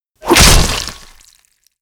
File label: npc kill